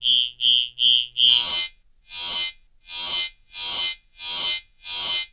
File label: Pulsar Wave, Electronics; Sony Pictures Sound Effects Series Volumes 1-10; Sony Creative Software